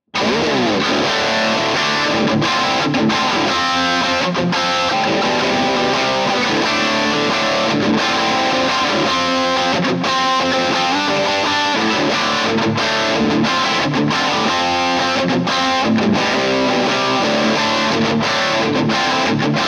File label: characteristic sound; jensentone